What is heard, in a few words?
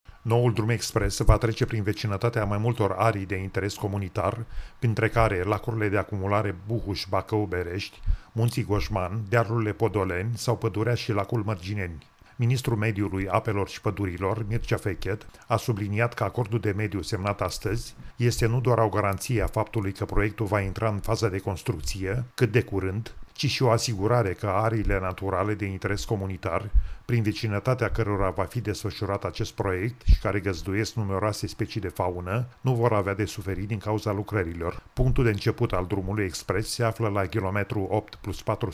Pop drum